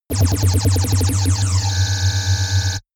space charged laser blaster big; krieg-waffen; soundpool

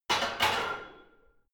barbell heavy plates replace rack; sport freizeit; fitnessstudio

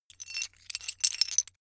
metal pieces clatter

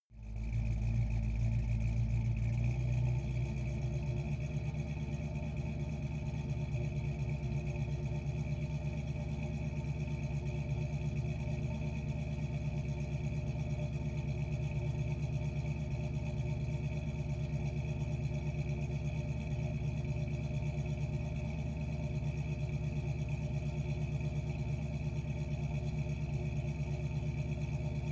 car camaro idle faster exhaust

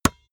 basketball dribble tap; sport freizeit

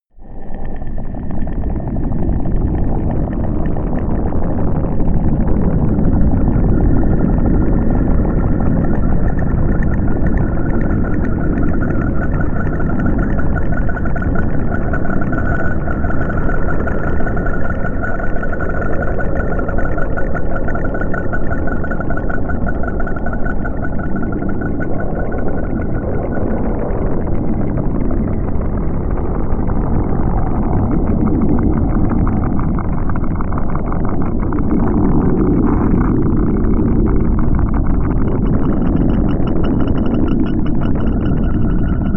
drone bubbling

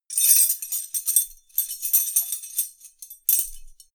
wrench jingle; industrie-wirtschaft; baugewerbe

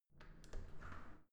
entrance door open

